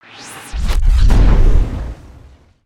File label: super jump full